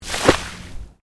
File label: Water Splash Concrete Medium; Elemental weather effects; Water (Sound Effects:Nature)